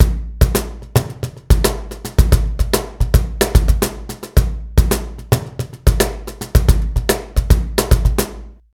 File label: Cajon Coda; Brunobrgs